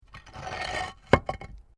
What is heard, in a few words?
PAVING STONE FALL